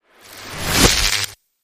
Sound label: Ignition Booster